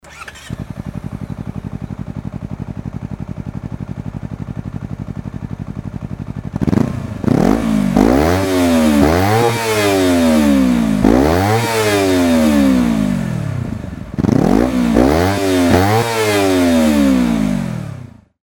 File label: cbr250rr